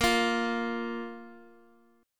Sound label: A sharp 5th A sharp